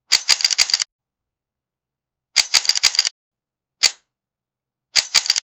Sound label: realistic dslr camera shu